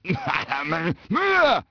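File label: male angry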